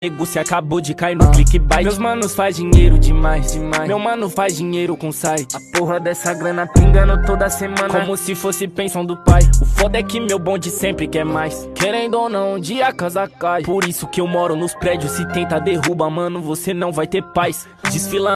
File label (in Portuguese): musica